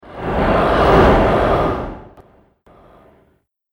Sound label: Ghost Wide Motion Whoosh; Whoosh Sound Effects